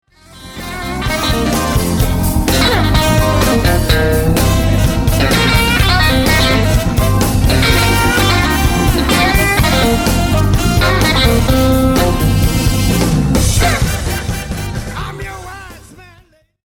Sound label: lick